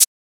Shaker

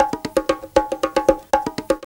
BONGO CONGA LOOP

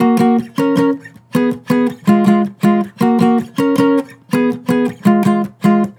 Acoustic Chord Loop 160 BPM Eb Min; Cymatics

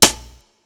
Snare

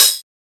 Open Hat; FLPRO

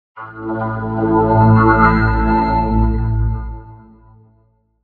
Windows XP Startup Sound in Complex Pad, Vocoder

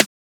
Transient; FLPRO

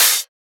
Open Hat; FLPRO

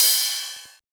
Ride; FLPRO

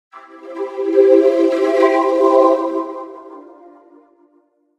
in Lost, Vocoder

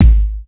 KIK, Sample Kit

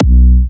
Slobber, Big Room Kicks

